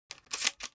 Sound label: mossberg reload, weapons